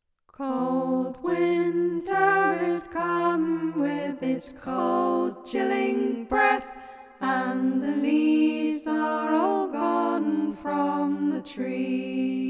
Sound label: Time To Remember The Poor 1st Verse All Parts